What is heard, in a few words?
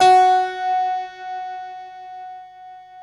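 six pianos, Distant Stack; Optical Media International; Sonic Images Library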